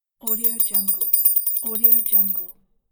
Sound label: metal ring circling